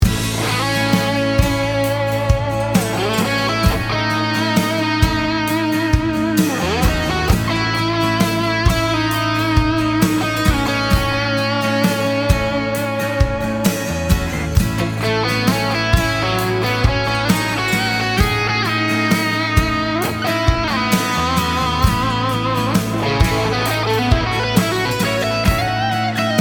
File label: Lydian Guitar Solo